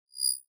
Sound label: cricket; mini-synth for making short "utility" audio cues